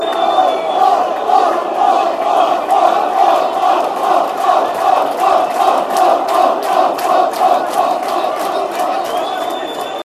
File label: off, chants; hibs